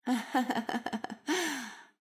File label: Damsels; Blind Quest - The Frost Demon